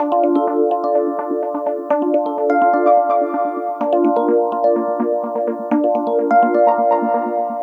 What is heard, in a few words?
Root D 126 BPM; Vengeance Samples; VEH3 Special Sounds